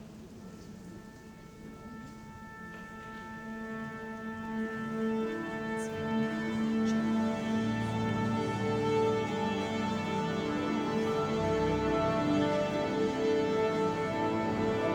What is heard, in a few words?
Concert Pitch Orchestra Tuning